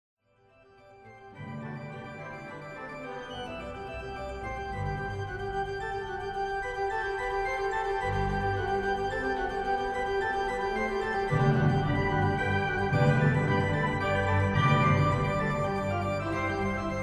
sinfonia

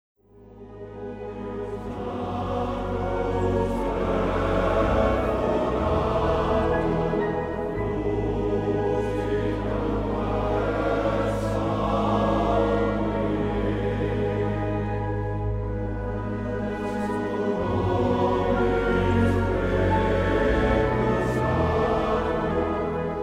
ave verum

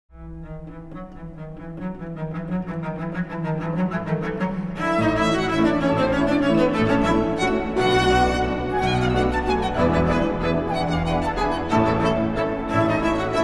waltz

